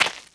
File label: FSTEP, Moves; ageofwonder